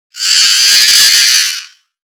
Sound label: screamer, cursed owl; quitoypongo